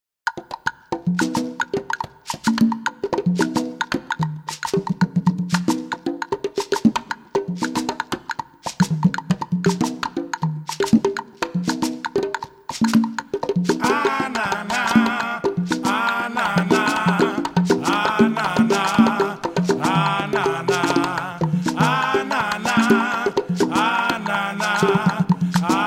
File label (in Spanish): Rumba pa los Trombones